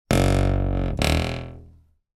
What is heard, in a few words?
household door creak wooden hollow